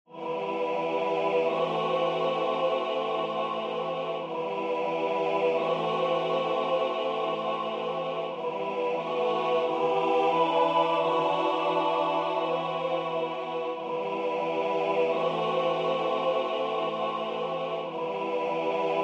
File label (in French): Noel tzigane soliste homme